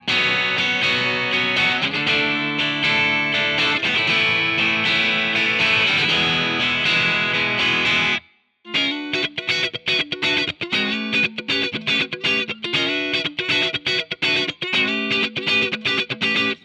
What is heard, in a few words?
Amp Neve